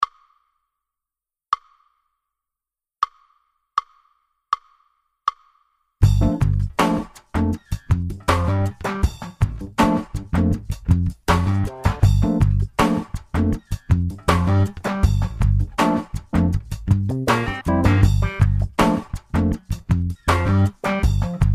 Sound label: Base Smooth Jazz Gm